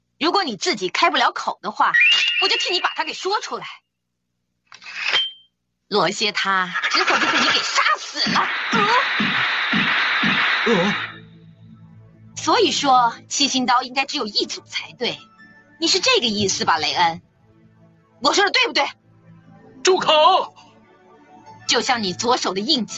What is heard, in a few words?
RRRRRRRRRRRRRRRRRRRRRRRR